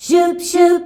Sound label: SCHUBSC F, FEMALES